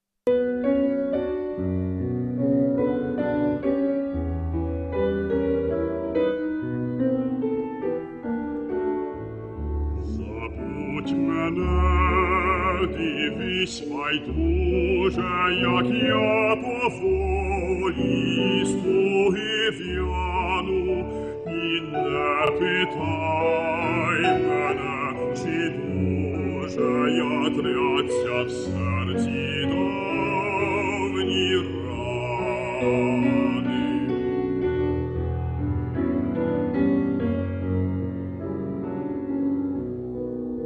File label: ukrainian art song; kyrylo stetsenko